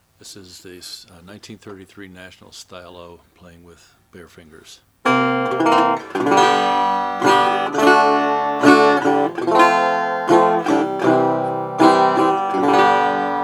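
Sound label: national style o nickel hawaiian, Guitars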